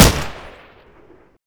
bar shoot, weapons

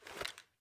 weapon deploy; weapons

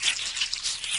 infector idle; flood

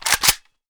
ak47 cock; weapons

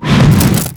frost hit

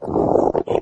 death, flood

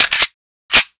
garand world reload; weapons

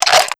shells ammo; serioussam2

